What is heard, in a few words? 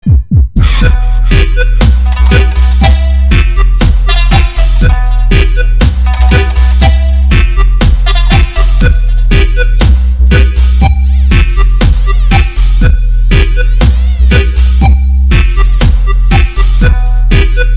panda dub